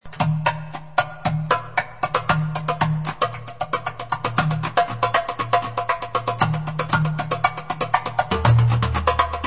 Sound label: doumbeg